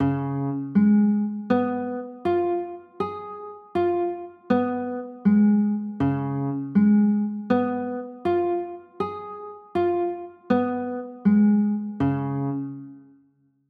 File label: c sharp 6 9, chords